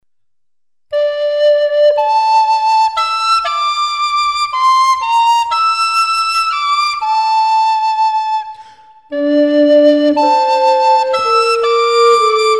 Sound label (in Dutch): blokfluitduet